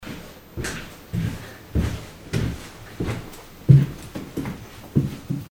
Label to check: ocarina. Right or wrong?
Wrong